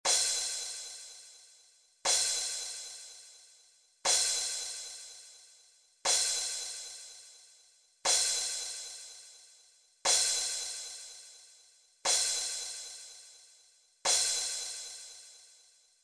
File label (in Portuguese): Toque - Prato; fanfarra